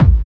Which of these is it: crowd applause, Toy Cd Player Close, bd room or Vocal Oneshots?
bd room